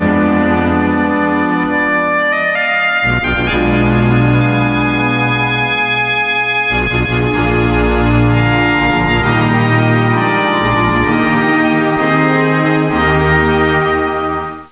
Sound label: Lose; Civilization Windows